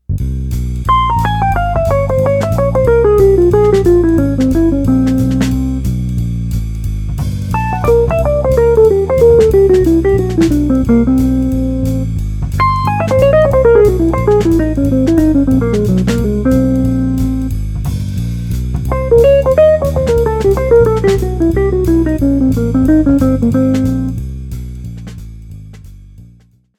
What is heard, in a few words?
Dominant 4 modes